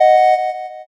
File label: horn